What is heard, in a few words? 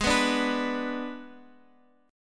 G sharp Diminished G sharp, Guitar Open F; G#dim strummed; JGuitar